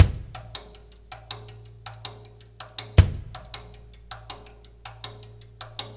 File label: Russ Loop, Laidback; Sonic Foundry (Sony Creative Software); Drum Tools